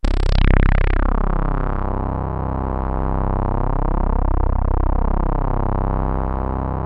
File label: FAT SAWZ; MORPHEUS